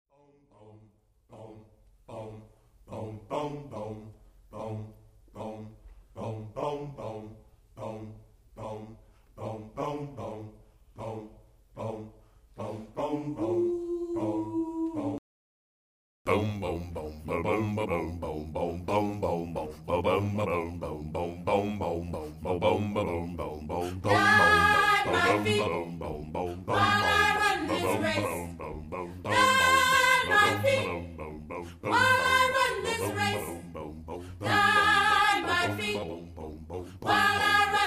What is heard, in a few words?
choir